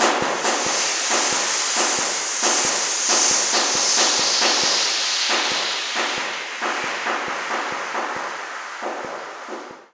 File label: Cave Tsheetgroove